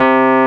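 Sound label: WURLY NO, Wurlitzer electric piano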